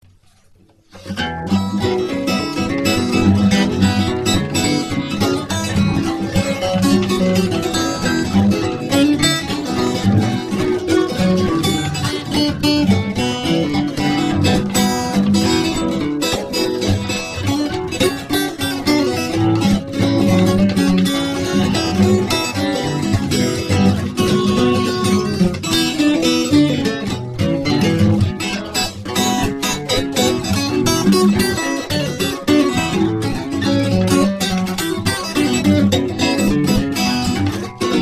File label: accoustic rock n roll; Old-oustic